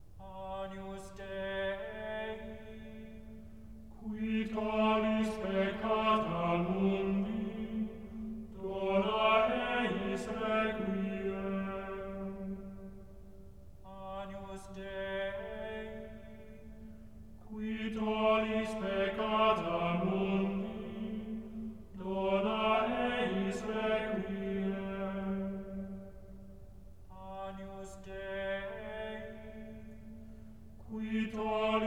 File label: Requiem Missa pro defunctis Agnus Dei Gregorian chan; Requiem Missa pro defunctis a 2-4; Gregorian chant